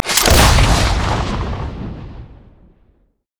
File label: quickdraw; LoL; yasuo skin01